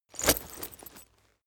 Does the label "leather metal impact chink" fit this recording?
Yes